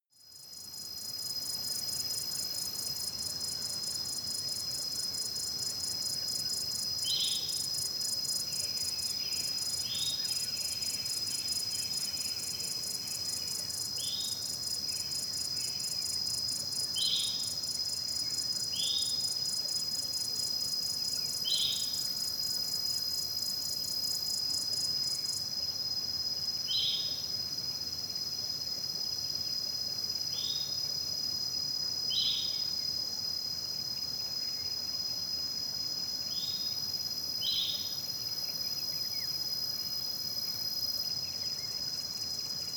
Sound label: savanna summer bird